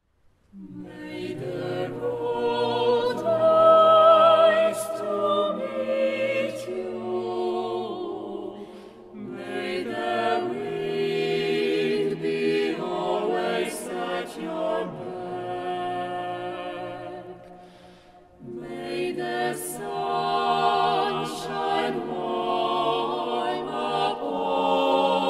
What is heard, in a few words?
Irish blessing, Zespol Wokalny; Moore